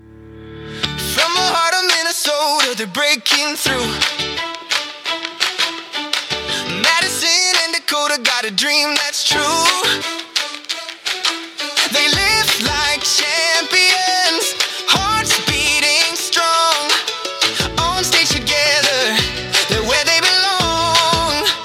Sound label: Anthem upbeat; madco fit